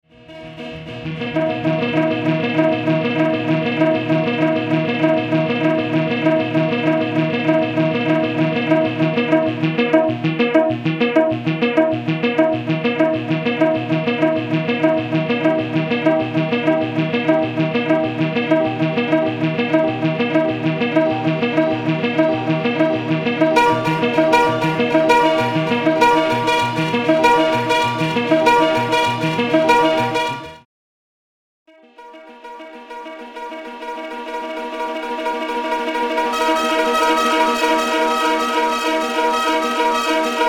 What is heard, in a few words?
Blofeld